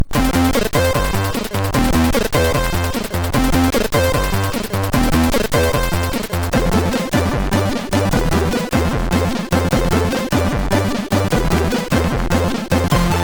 tune, MOS6581R4; Tripler tune 5

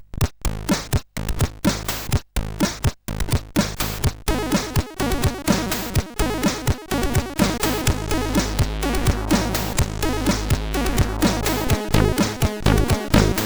Hip Hop Land, sid, CSG8580R5

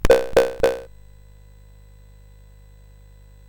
CSG8580R5, GAMES; Street Rod

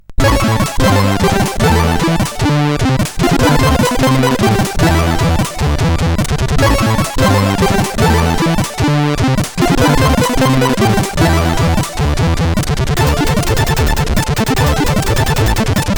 Orbtro, sid CSG8580R5

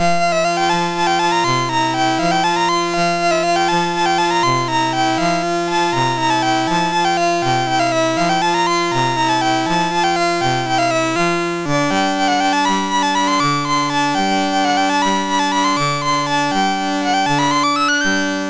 Allegro, MOS6581R2

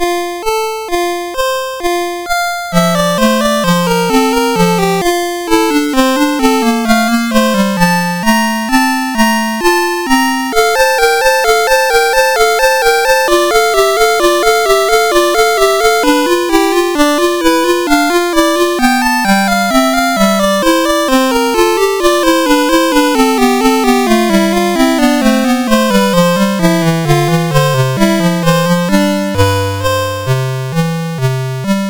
sid MOS6581R4, GAMES; Forest of Doom

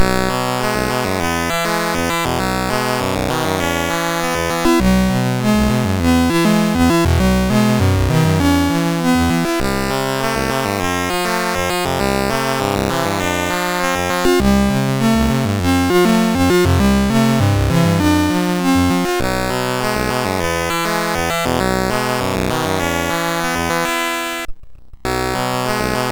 Zula, sid MOS6581R3

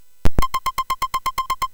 Shuffle, MOS6581R3

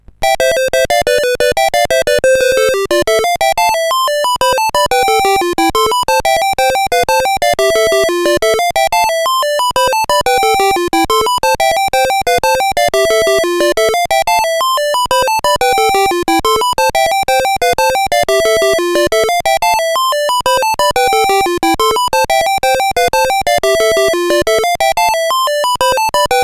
Musicbox, sid CSG8580R5

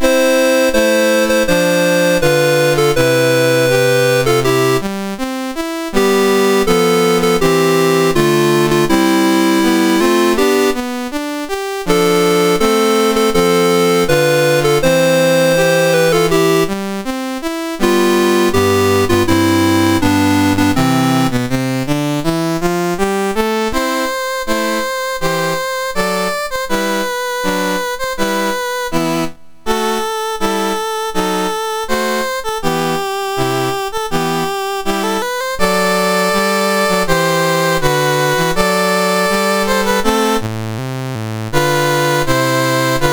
Egypt, National Anthems; MOS6581R2; Dave Marquis

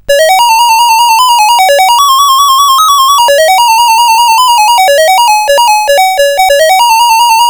Quari, CSG8580R5

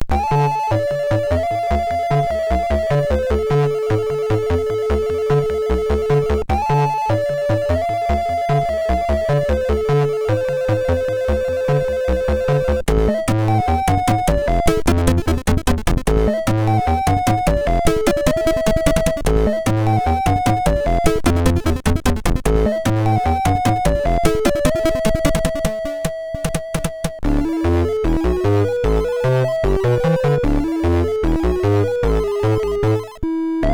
Heatbeat, MOS6581R4; sid

